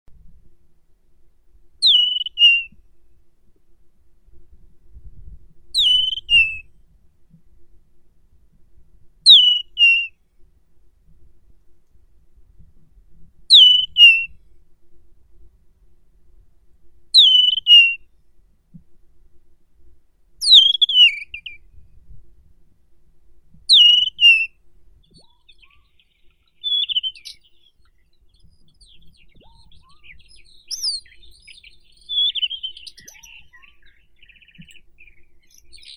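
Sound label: desert wheatear, Oenanthe deserti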